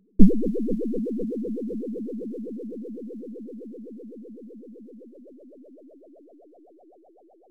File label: ufo takeoff